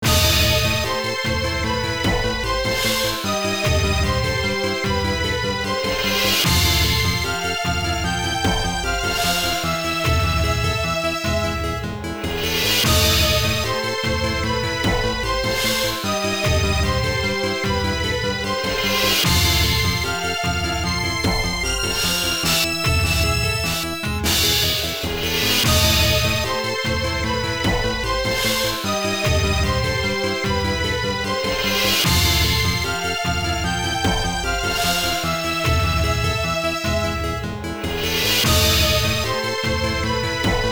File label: Tense; Garou Mark of the Wolves; Neo Geo